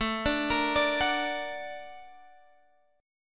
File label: Bb Augmented Major 7th A